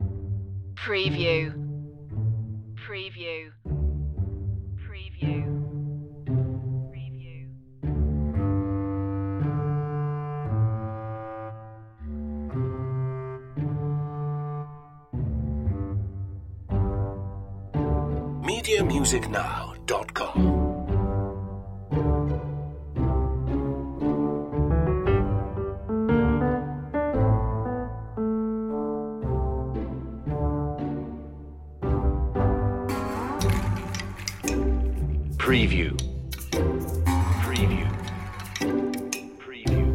the banjo man underscore

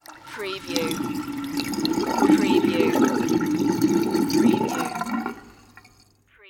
water runs off drain

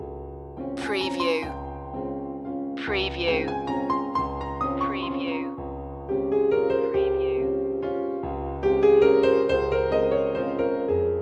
lydian poetry loop

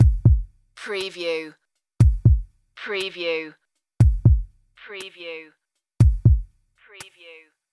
tick tock tension loop

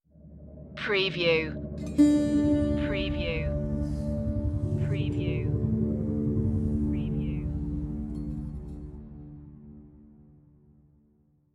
anguish stinger

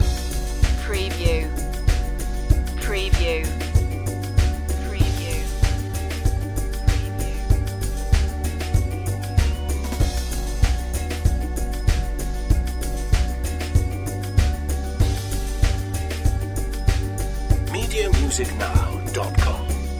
the spooky pumpkin head loop

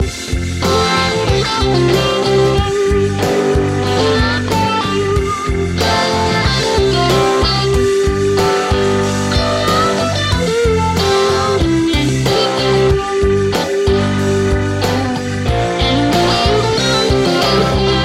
flower rock loop

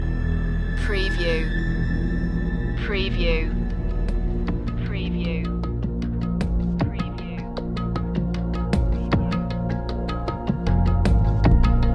underworld series dramatic cue

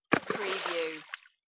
Rock splash

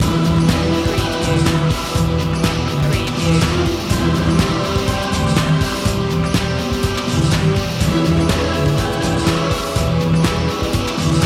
rock mix

